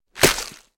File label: Bullet Hits Body Wet I